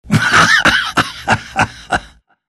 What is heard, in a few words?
plance laugh; phantom lancer